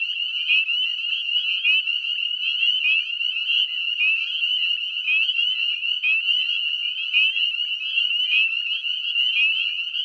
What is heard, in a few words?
Spring Peeper, Pseudacris crucifer; Albany Pine Bush Preserve; Amphibians; Springtime Sounds - Albany Pine Bush Preserve; Active: Early spring